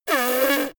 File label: little girl snort; soundpool